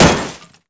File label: Rifle Metal, Damage